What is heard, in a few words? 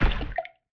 water drop foot stomp; ambience and background fx; nova sound